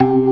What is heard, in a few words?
ORGAN, C3 PERC; Keith Emerson Signature Series